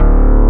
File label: SUB BASS; Techno Trance; Synth Basses; E-MU Formula 4000 Series Vol. 2